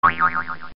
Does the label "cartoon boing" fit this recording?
Yes